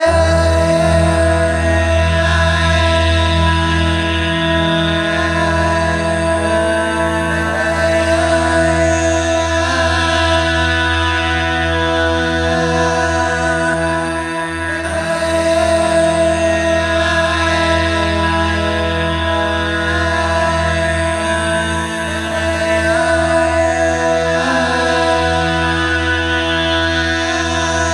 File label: 130 E Easternova Full